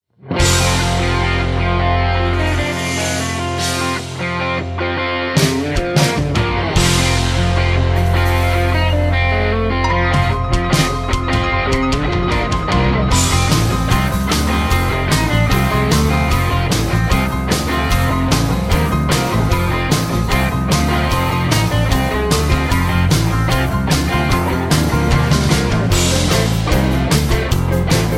Medley (F To G) No Backing Vocals

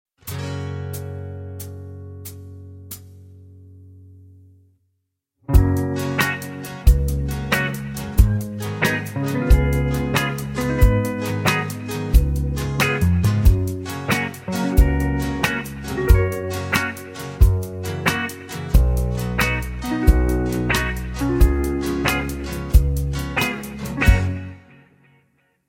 (Gb To G) No Backing Vocals

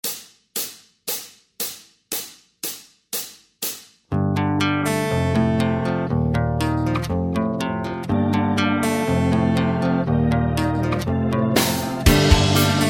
(Gm) No Backing Vocals

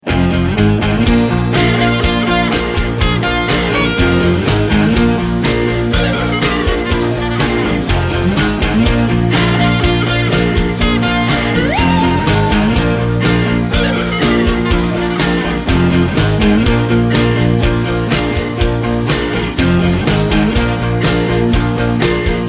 (Bb) No Backing Vocals

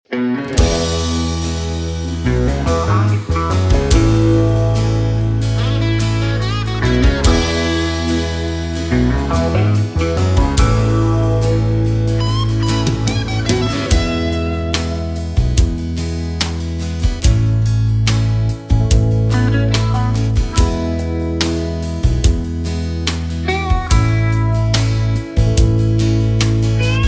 E No Backing Vocals, backing tracks

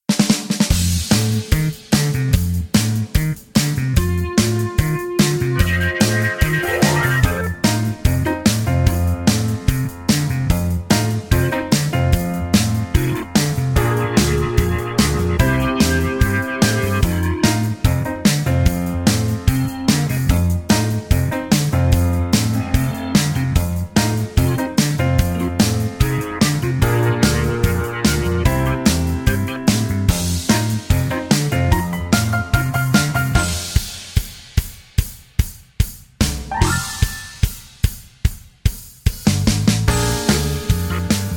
F No Guitar No Backing Vocals, backing tracks

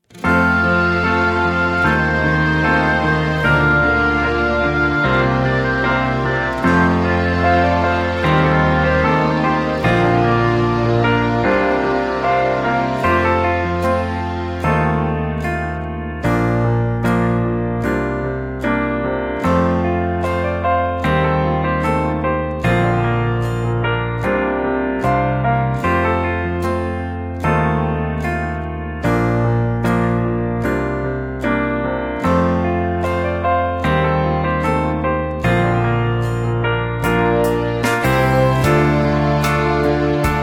F No Backing Vocals, backing tracks

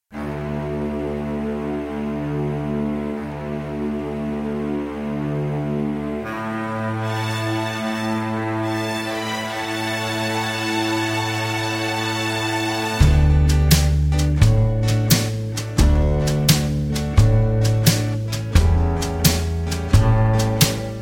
D No Backing Vocals, backing tracks

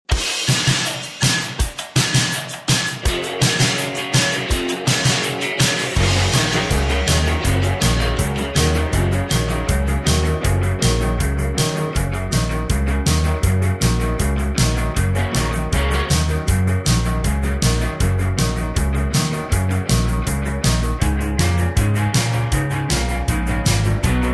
Gb No Backing Vocals, backing tracks

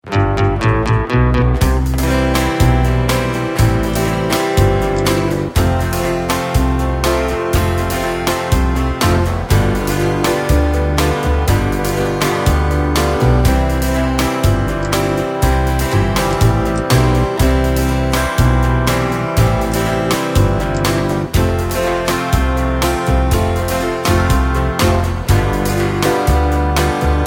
C To D No Backing Vocals, backing tracks

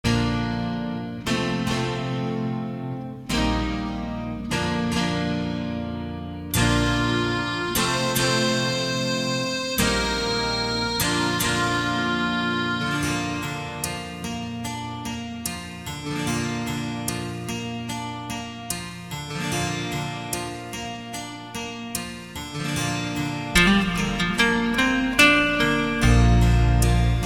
Bb, backing tracks

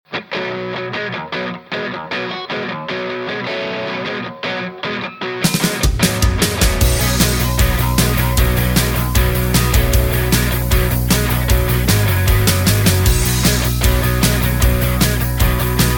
B No Backing Vocals, backing tracks

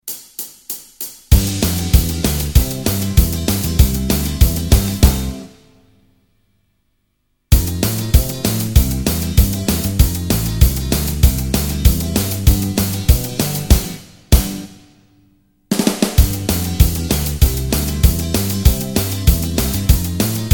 (C) No Lead Guitar

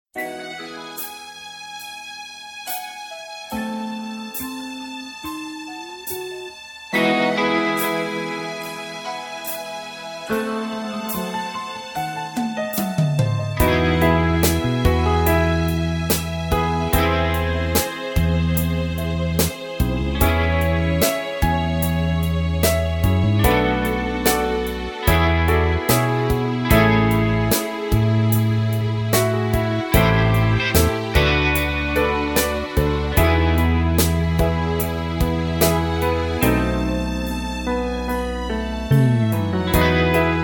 E No Backing Vocals, backing tracks

